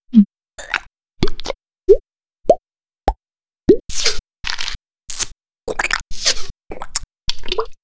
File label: GUI SFX